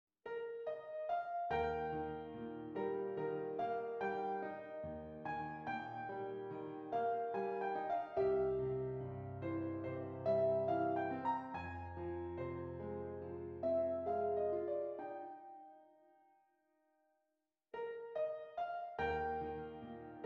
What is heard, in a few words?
Prelude in E Flat Major; Autumn Prelude No. 4; Autumn Preludes